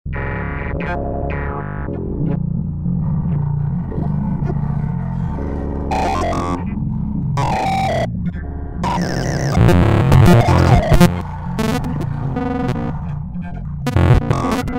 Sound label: motor